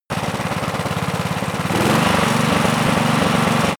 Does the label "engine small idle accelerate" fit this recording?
Yes